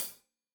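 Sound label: hat closed, hi-hat cymbals stereo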